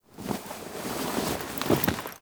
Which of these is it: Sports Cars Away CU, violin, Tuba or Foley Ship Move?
Foley Ship Move